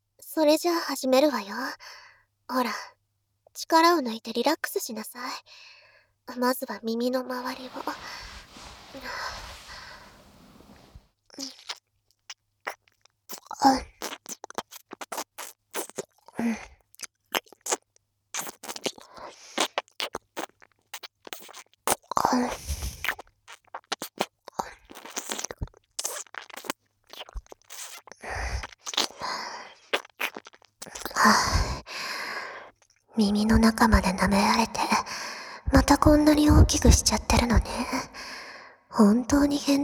唾液まみれの両耳舐め、耳責め; アーシャ

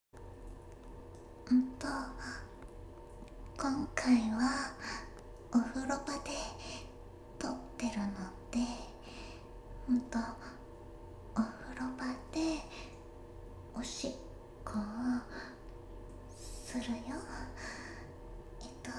環境音あり、マスタリング無し

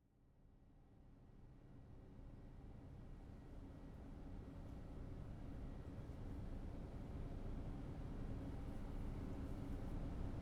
ASMR 精密耳掻き・右耳 ピンセット、スプーン、スパイラルを使い分ける精緻な右耳耳かき; アルジェの精密耳掻き・右耳

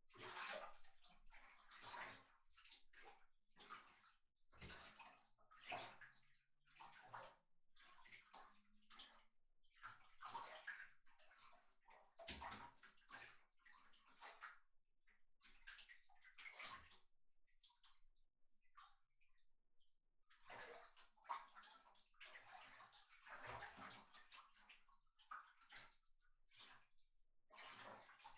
お風呂のちゃぷちゃぷ; KU100; お風呂に関する音

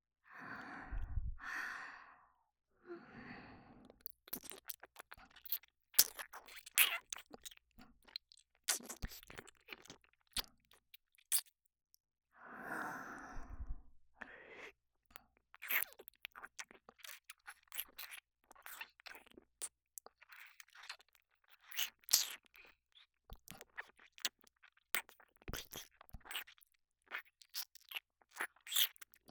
耳舐めリピートボイス 右耳, ASMR; 【NTRヒカリちゃん】

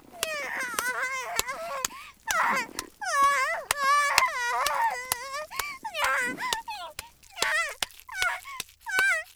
うにゃあぁ; 効果音あり

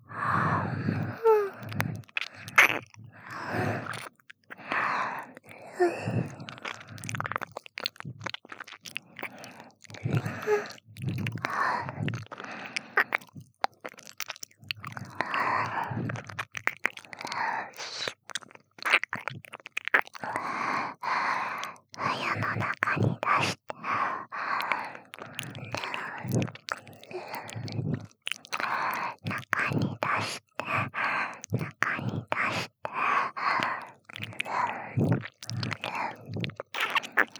中に出して 耳舐め

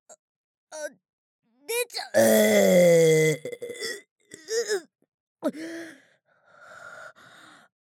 ゲップ（特大）; ザーメンゲップ集; ザーメンゲップ（特大）

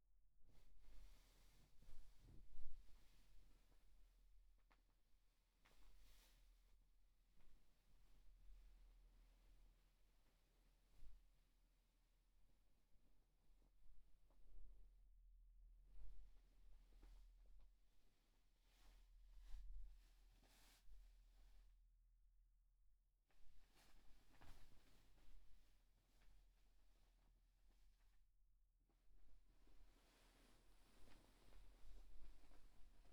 布団 を もぞもぞ; KU100; 布団の音

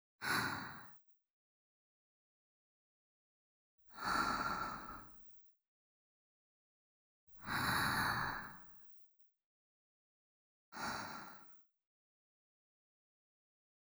添い寝呼吸音 左; おだやかママ

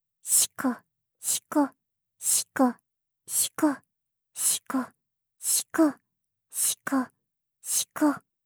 シコシコ オンリー ふつう; オナナビ4 ～ゆかりのオナニーナビゲーション～

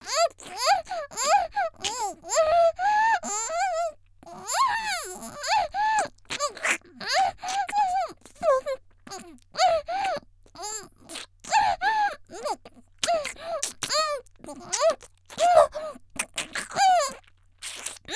「喘ぎ」口にも入れられくぐもった状態（嫌がり）, 少女; 元気な少女

